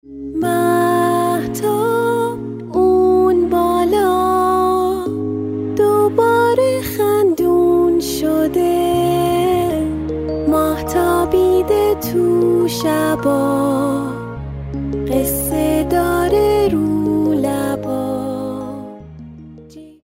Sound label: Lullaby